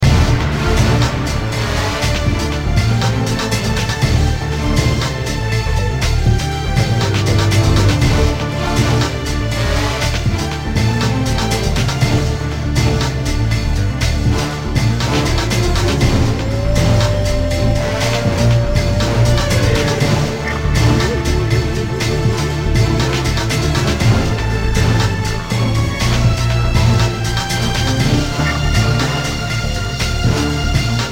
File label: Alert